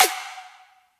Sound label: Pop Snare F#; Drums; One Shots; Snares; Cymatics; EDM Starter Pack